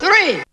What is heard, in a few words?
TOON